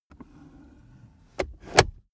car accessories center console drawer close; autos; verkehr transport

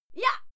female fight scream short

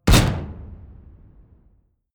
sci-fi projectile penetrate metal hard; schusswaffen

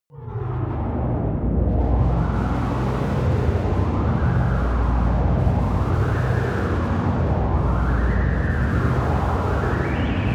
alien ship dark hallway; raumschiff

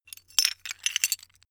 metal pieces clatter short

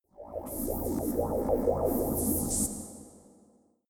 sci-fi loading low, pulsieren

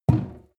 sink pull plug no water; industrie-wirtschaft; gastronomie

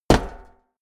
step walk metal hollow; schritte

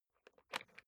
briefcase plastic latch lock key turn gentle; industrie wirtschaft; bueroarbeit

